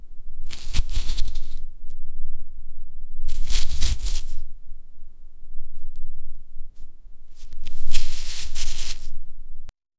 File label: grabbing something with t, touch